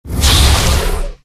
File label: ability challenge effect; abilities